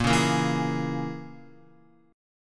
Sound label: A sharp Minor 7th Flat 5th A sharp, Guitar 4F,4A,5C,5G,5C,6E; strummed